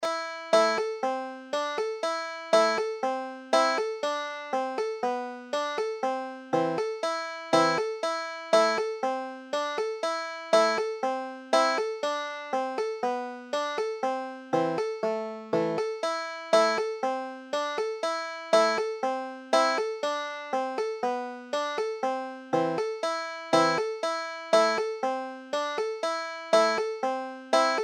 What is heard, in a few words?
russian folk song, banjo